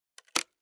Splitting Wooden Crate With Hammer Crowbar Tearing Splintering